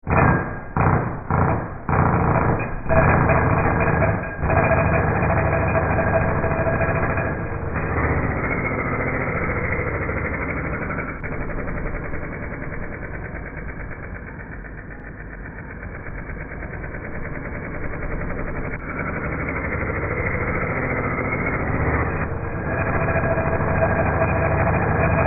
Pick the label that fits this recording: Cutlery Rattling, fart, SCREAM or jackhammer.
jackhammer